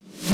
Reverse SD

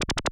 Synth SE

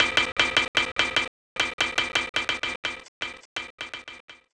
metal communication

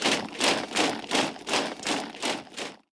infantry

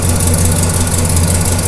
truck move

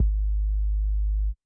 BD

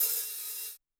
HH Std1 Op

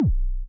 BD ZAP

